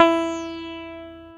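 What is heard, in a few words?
ELECTRIC SITAR E3; AKAI S6000 CD-ROM - Volume 1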